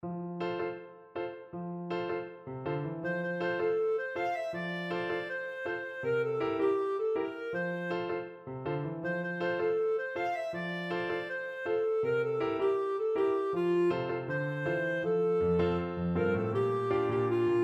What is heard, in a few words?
linstead market, clarinet